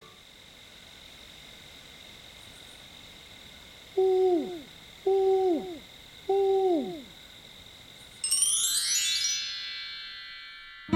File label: baby snowy owl; bach